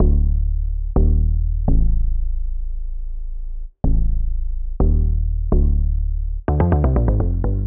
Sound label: House Bass Loops, D, 125; Untrustworthy